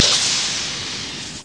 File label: STAR DOOR; Lemmings; PC Gamer 2000 June